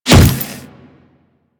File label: Glass Unbreakable Impact; Warframe